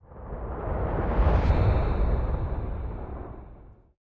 rewind end

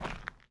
step gravel

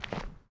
step gravel

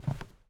step wood

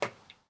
tap

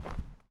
step concrete

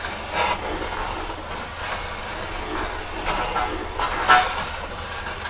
grind loop

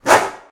revert

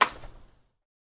jump

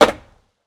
land

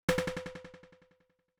objective fail

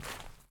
step bush

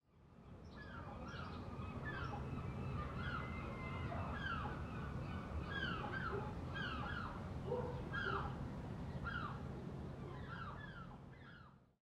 bird

